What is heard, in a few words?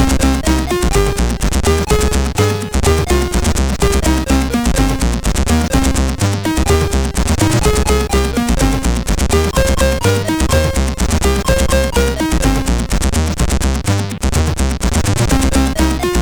Rap Mix; hvsc